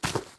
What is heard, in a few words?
grass step; Golden Crayon Sounds